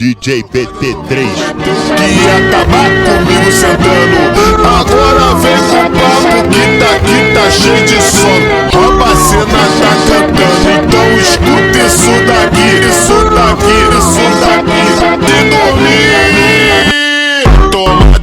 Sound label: HORROR FUNK Super Slowed